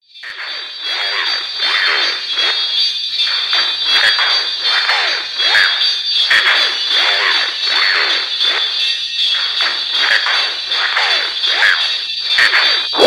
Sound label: Extract Live Set Tekno Tribe-Acid